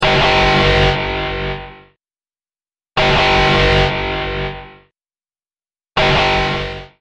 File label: Large Digital Alarm